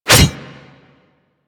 Ghoul Devourer Melee Swipe

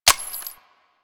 Pistol Reload B; Bard

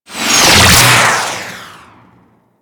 Beam Gun Fire Close Up 3D; Player Path Beam; Fomorian

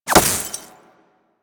Laser Impact Glass Large; Warframe; Weapons; Bullet Impacts

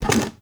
Vase Medium Slide

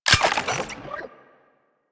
Modular Secondary Handle Slow Reload, Guns; SU Modular Guns; Reloads

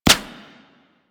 Sentient Projectile Hit; Warframe; Sentients; Symbilyst; Weapons

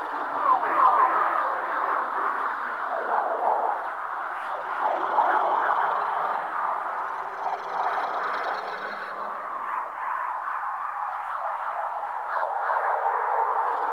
Decoy Active Loop; Powers; Fey; Saboteur

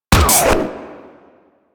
Harpoon Gun Charged Shot; Warframe; Sharkwing; Grineer

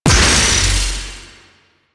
Archer Disengage Ability Explode A; Warframe; Duviri; Duviri Dax; Abilities

